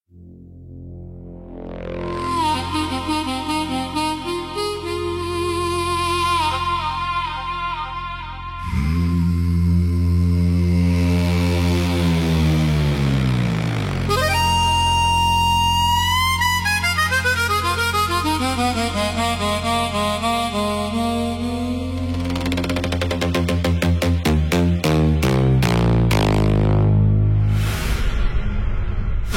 move like train signature golden rhythm snap deep house style